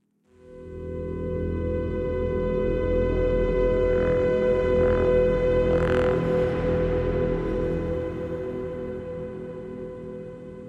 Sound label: Emerge